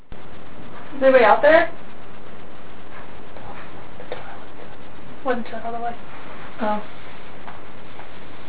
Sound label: male whisper